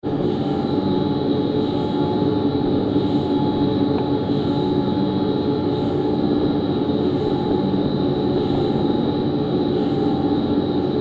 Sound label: Inside a windturbine